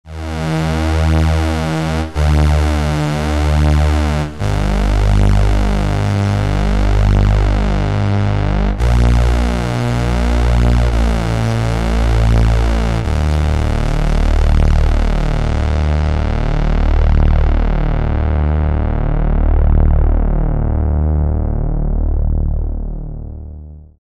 bass

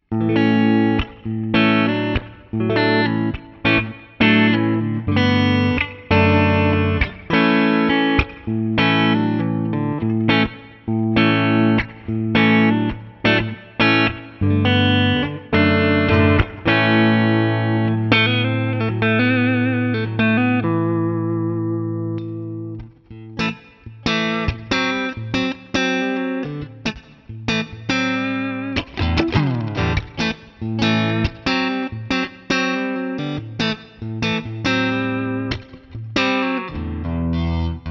Paul Reed Smith Neck PU Humbucker Split clean; Thomann 70th

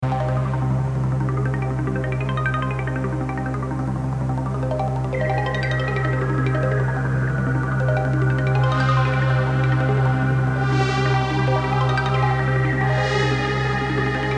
Behringer Solina Mod Phaser Juno 60

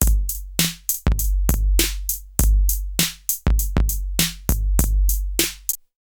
AMS dmx 15-80S 808 loop

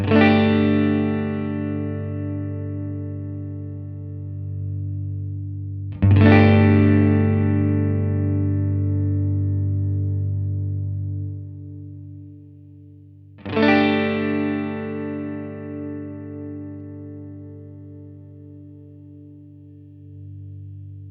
Carl Martin Tone Tweaker Bass

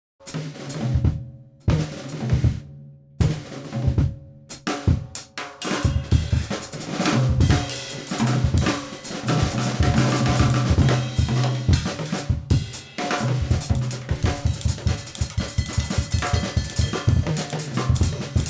Freejazz Solo dry

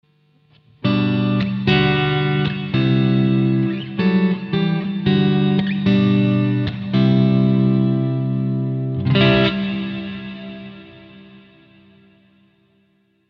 Amp A Reverb Ether; Simlpifier

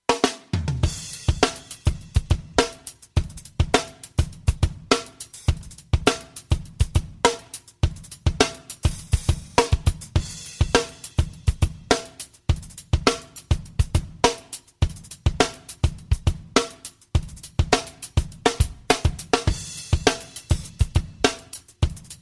Elysia XFilter Drums Bass Boost

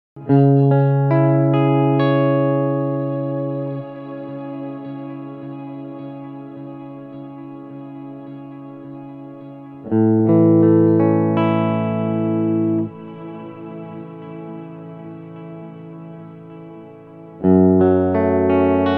Endorphines Ghost Pedal Ducking Shimmer

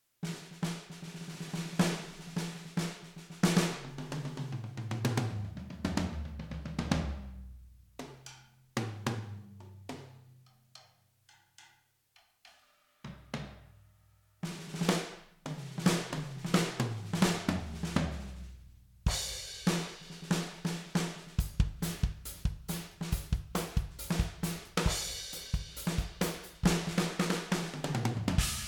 Cali Maple 2 Kit; ALESIS Strata Prime

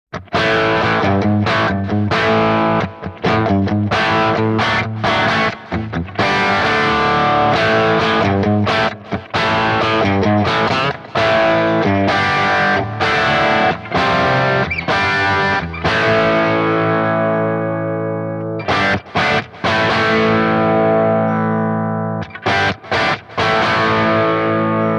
Positive Grid Spark 40 Crunch

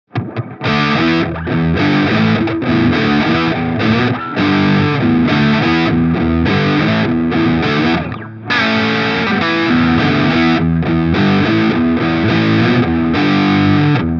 Beetronix Nectar Honey full Taste 12h Fuzz Mode

